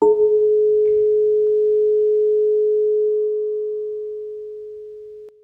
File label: Experiment tone b